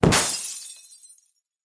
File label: Landing Hit; Pajamas; smite; kumbhakarna